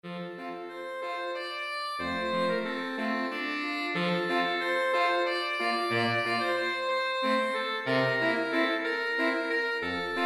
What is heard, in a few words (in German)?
saxophonquintett